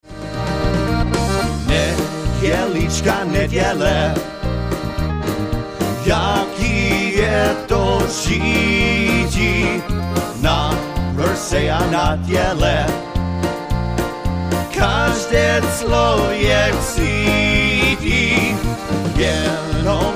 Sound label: Sunday Polka